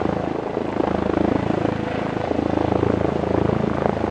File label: Helicopter Hover; blastmorph pinball; xbox series xs